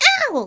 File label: attacked; toadette; SM64 CO-OP